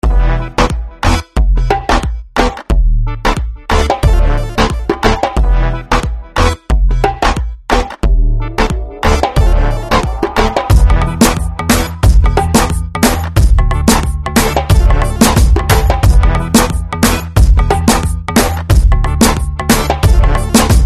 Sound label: Regeton Ringtone